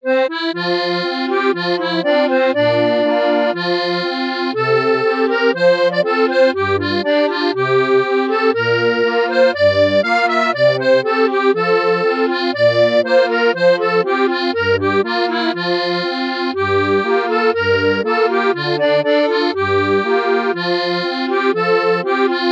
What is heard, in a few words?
Celtic Lament